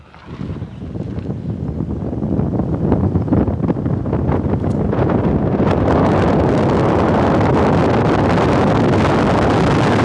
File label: GoPro wind noise; lotus elan blog